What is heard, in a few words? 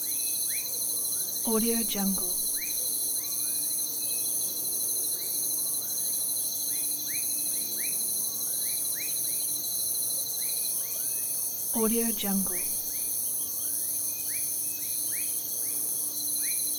night crickets and frogs; nature sounds; صدای جیرجیرک و قورباغ